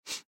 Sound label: Human Nose Sniff, Nose Sniff Short; Sniffling; Blastwave; Humans